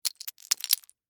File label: Crash Ice Debris, Crash, Ice, Debris, Drop, On, Ground; Debris (Sound Effects:Materials); SoundEffects-BlastWave